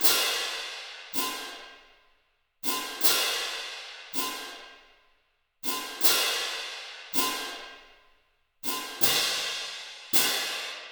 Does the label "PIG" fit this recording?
No